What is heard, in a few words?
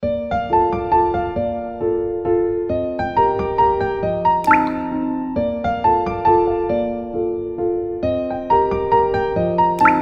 Waltz; LG G5